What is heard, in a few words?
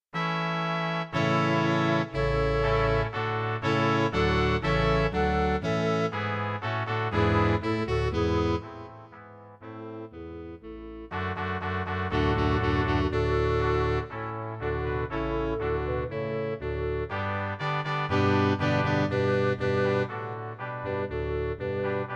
echo song